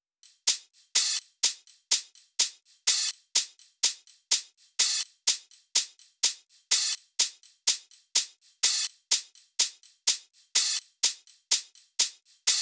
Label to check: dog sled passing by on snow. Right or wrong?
Wrong